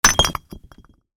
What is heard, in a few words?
rock drop